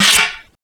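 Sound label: zang, metal impact; Total Control - Action Cutz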